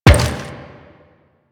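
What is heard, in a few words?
step on metal, footsteps; Warframe; The New War; Kahl; Quests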